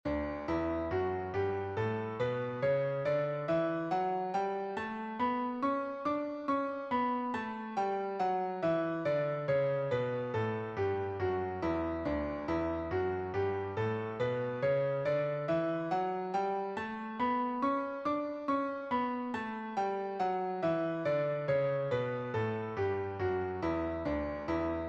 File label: Scale D Two Octaves